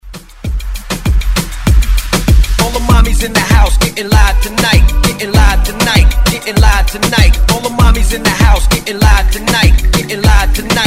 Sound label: Moombahton Hype Redrum